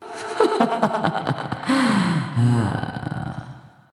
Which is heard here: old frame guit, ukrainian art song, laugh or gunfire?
laugh